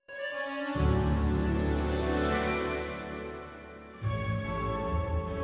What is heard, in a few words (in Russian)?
Припев аккордеон